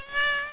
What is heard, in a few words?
Meow